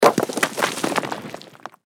ore fall

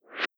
whosh

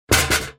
metal door fall

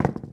drop; Police

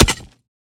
pickaxe hit

